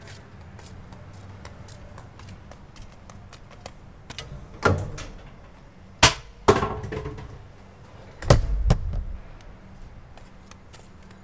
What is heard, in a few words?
Container